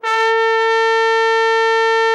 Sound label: TROMBONE, Killer Horns; Best Service